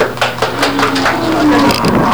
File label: cow